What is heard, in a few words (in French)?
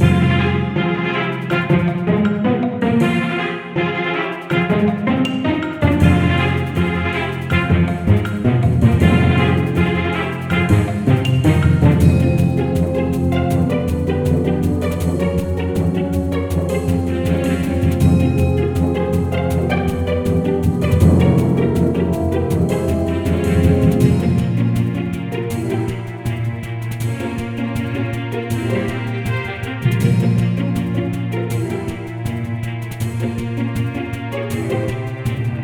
caverne; time chicken tracks